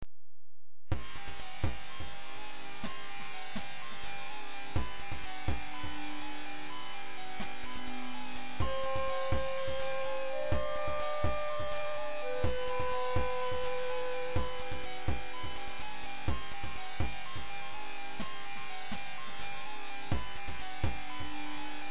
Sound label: drumtest, turbo sound